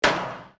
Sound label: gavel